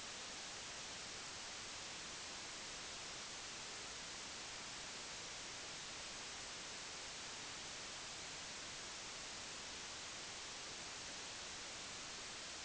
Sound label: noise standard